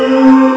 Mechanic, Amiga Soundtracker; ST-XX Amiga Soundtracker Sample Packs

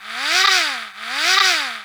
P WS HAAA, VOX; CMP - Analogon

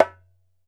ASHIKO, African Perc

